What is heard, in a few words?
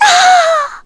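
Vox Dead, Korean; heroes; Frey; kingsraid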